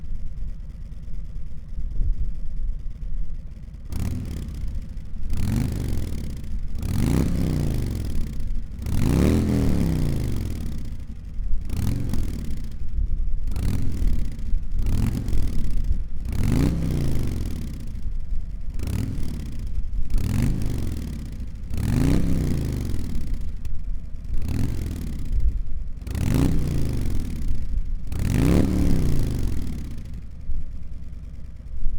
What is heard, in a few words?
Touring 107 STOCK Rev